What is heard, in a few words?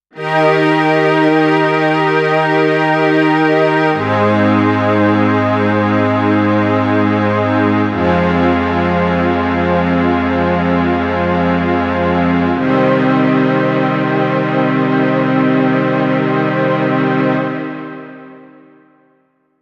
Strings